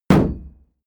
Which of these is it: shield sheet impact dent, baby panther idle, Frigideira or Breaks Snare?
shield sheet impact dent